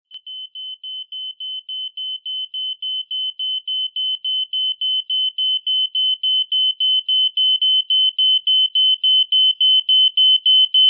Alarm

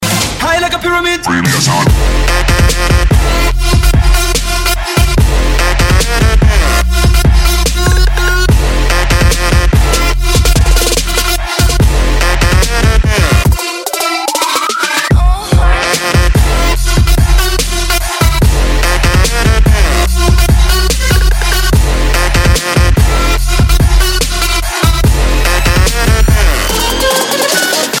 TRAP

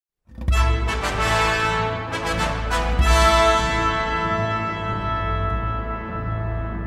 Fanfare

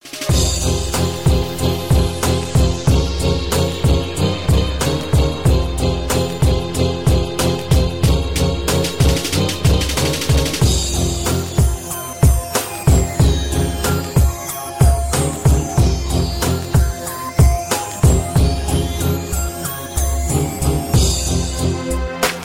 Hip Hop Beat

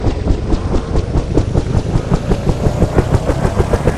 Helicopter Start

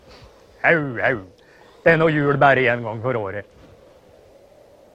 Gong

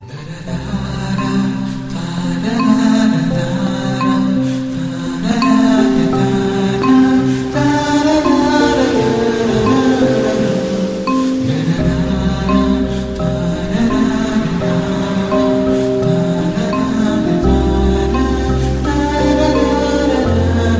Humming

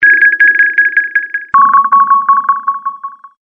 Pulsing Sms

